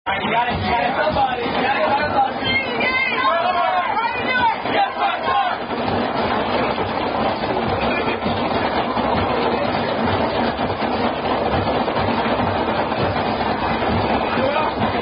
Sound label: Signs Indian Drinking Game; Thumper